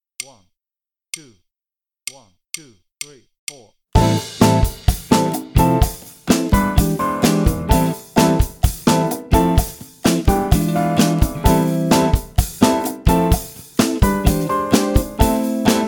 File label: ChaCha, Ritmo Nuevo, Play-along, Bass